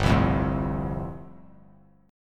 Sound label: G 7th Suspended 2nd Suspended 4th; strummed; JGuitar